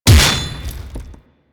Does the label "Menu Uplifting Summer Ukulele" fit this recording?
No